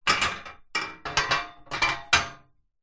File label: foley metal frame loose movement clatter; zapsplat